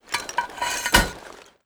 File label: insert rocket; Rust